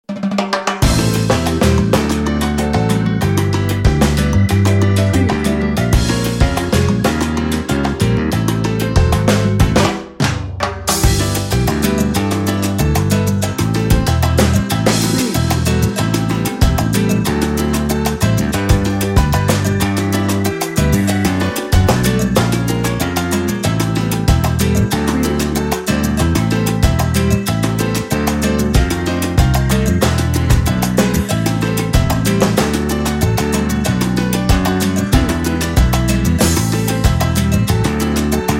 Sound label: salsa spirit no horns